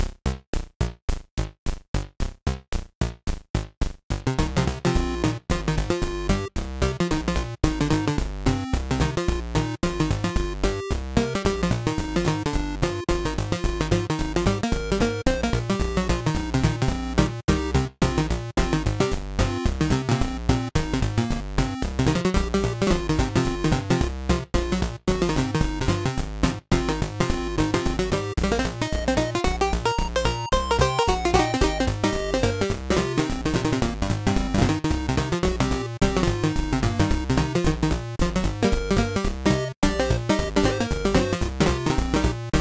8bit

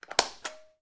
Light On

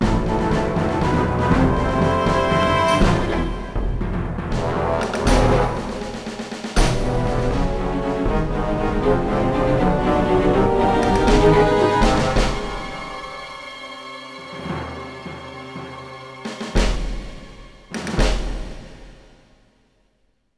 Duel Intro Music

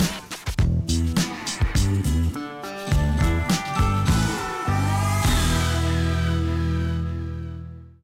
Quest Resistance Win

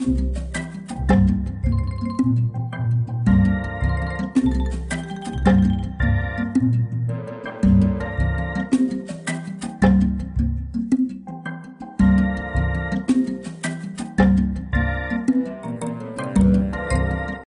Jungle Level

